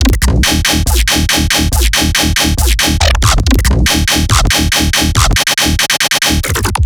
Riddim Bass Loop 140 D#; Dubstep; Riddim Basslines; Apex; Ghosthack; Advent Calendar 2019 Day 17; Riddim Bass Loops

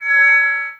Megaphone Feedback; Home & Office

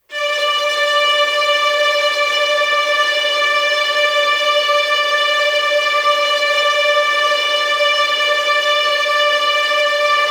Tremolo Strings - Am 120BPM; Construction Kits; STEMS; Ghosthack; SkyRealm; Kit 21 - Mystical Journey

